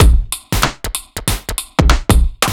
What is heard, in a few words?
Heavy Swing 95 D, 95bpm; off the grid